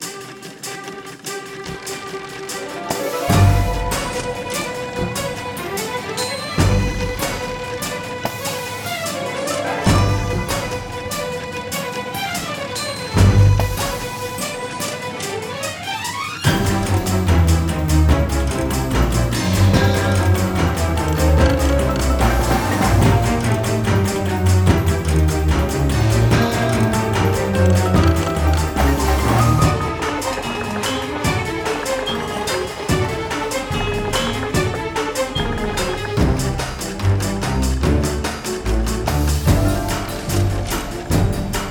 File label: Drum 'n' Strings